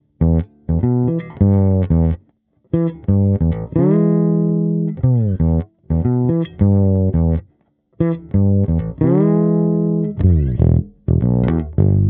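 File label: Fretless Thump Amp, bass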